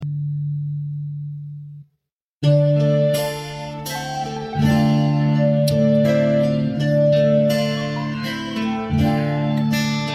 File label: tavern; witcher2